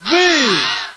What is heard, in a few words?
buzz; vietnam